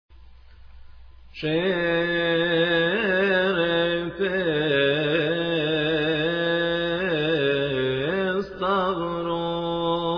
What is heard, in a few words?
cross cymbals